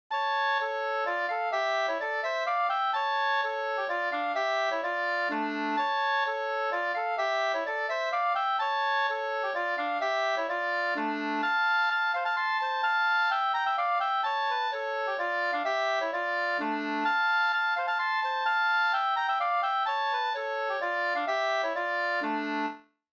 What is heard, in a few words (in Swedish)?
polska